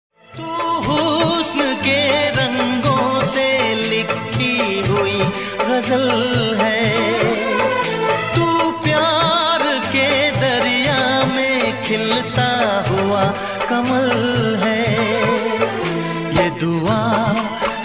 Old Hindi Song Ringtone

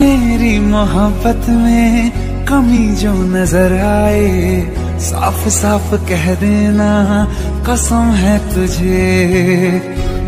Romantic Rington

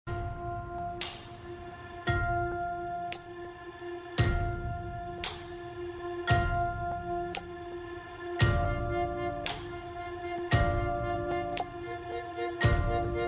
Iphone 6s Plus Tone Ringtone; ringtones

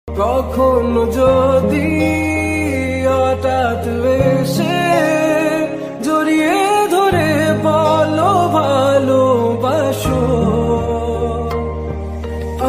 Bengali Ringtone

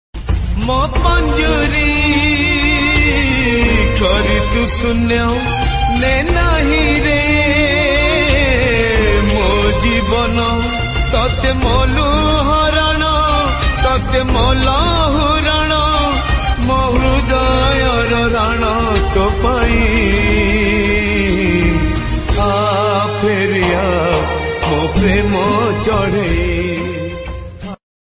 Odia Love Song Ringtone

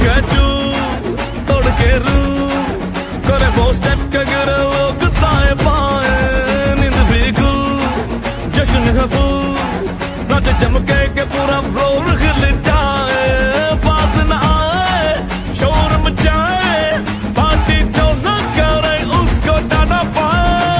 hindi Song Ringtone

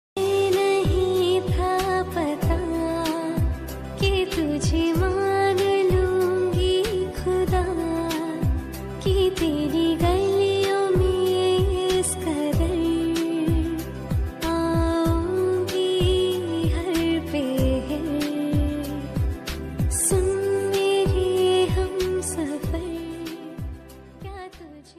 Sad Female Ringtone

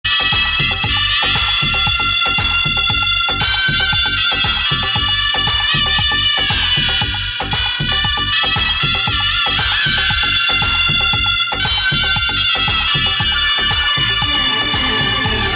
Music Ringtone

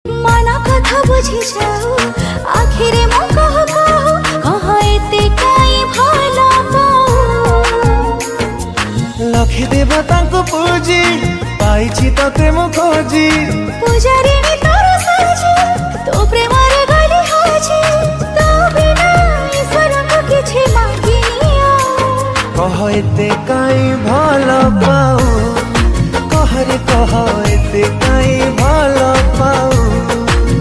Odia Sweet Romantic Song Ringtone

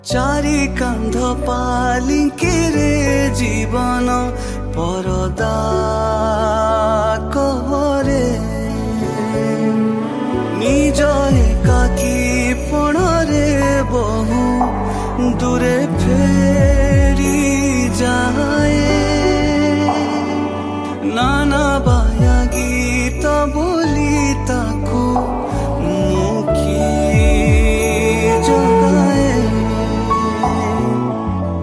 Odia Ringtone

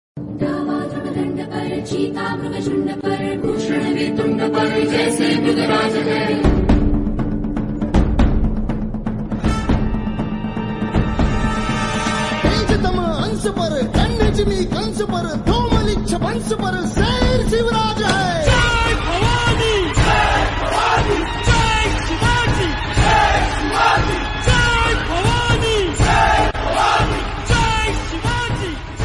Marathi Ringtone

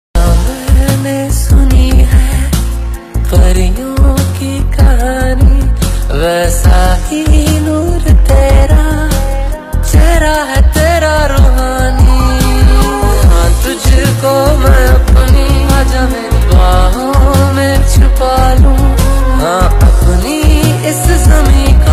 Beautiful Love Song Ringtone

